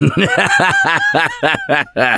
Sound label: Laughter, Survivor Voice; Gambler; russian